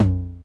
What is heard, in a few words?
MD TOM, COMPU DRUMS; Crypton - DrumBase5000